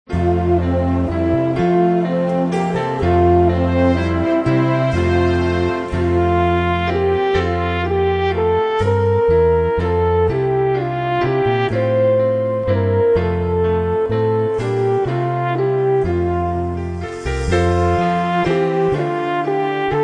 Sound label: Instrumental Hymns